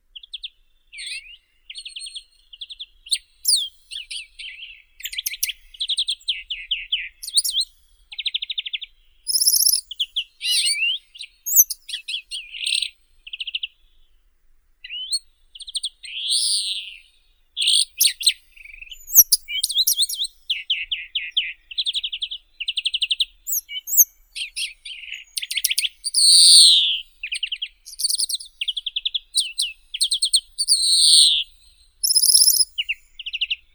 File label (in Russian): zelenushka obiknovennaya chloris chloris